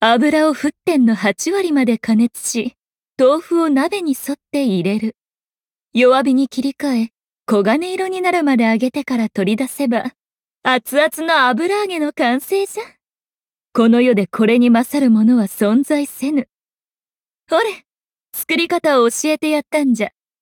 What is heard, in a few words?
applause